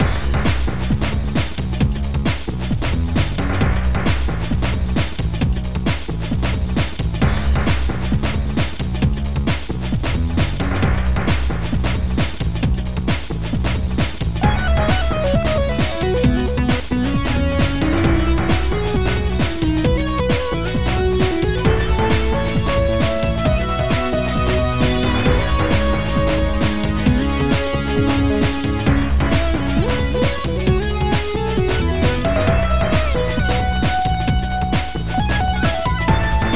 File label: Boss Tune; Jazz